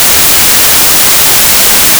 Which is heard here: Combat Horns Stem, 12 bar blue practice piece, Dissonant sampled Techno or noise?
noise